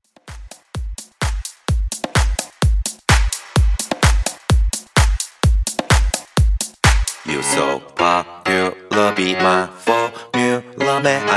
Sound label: Dance Pop Redrum 128Bpm